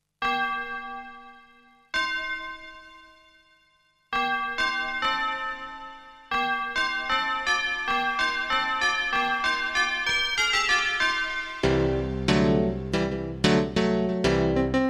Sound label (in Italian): prete e campane; Aggiungi Concerto